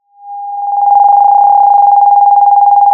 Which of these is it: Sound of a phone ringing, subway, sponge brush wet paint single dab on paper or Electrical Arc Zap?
Sound of a phone ringing